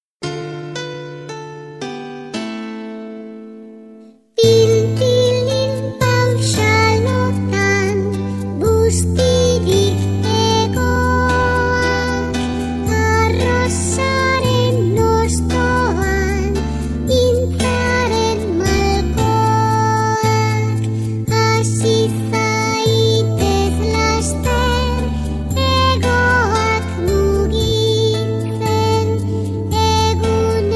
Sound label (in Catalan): Pinpilinpauxa amb lletra